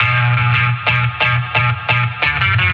guitar; Loops; Phonky

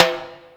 timbal; Misc Percussion

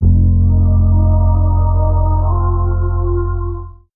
droning, Loops Electronic

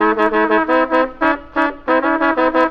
horns, Loops, Phonky

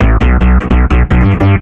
paging now, loops electronic

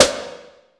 roped, snares real

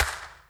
clappy; Snares

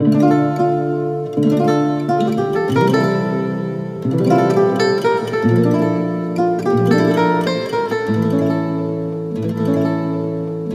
guitar, Jazzmatazz loops

